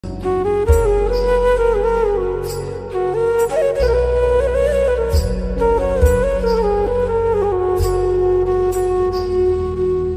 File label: New Ringtone Maa Ringtone Mother Ringtone Sad Ringtone Love Ringtone Mother Song Ringtone